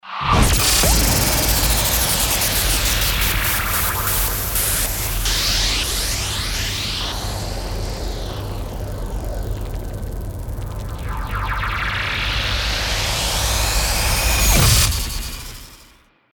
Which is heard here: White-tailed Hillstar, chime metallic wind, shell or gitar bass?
shell